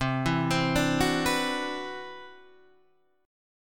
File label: C Major Sharp 11th, Guitar Half Step Down; CM#11